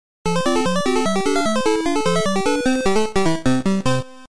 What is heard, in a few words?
boss victory; Super Mario Bros. 2